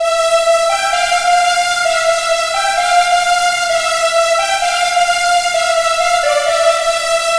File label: Voice Saw; fabrik pro